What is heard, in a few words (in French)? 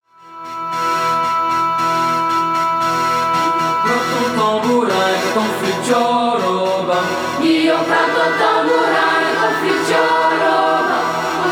prends ton tambourin, choeur d'enfants; guillo